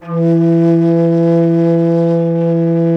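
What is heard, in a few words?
bass bansuri, World Winds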